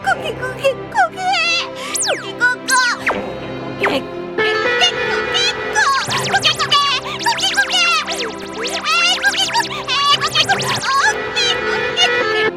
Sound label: RINGTONE